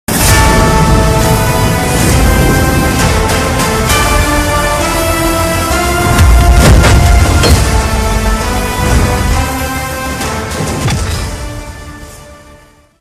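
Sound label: background music; rabort; dboss; umapathi films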